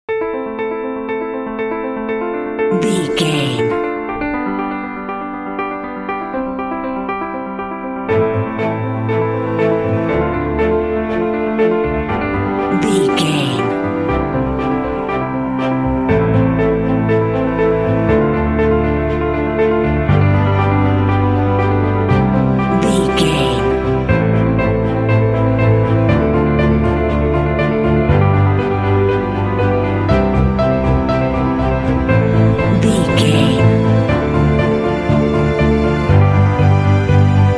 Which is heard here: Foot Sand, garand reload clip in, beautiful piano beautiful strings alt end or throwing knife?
beautiful piano beautiful strings alt end